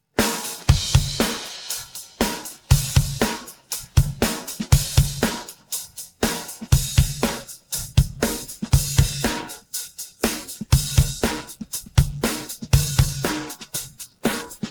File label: drums pattern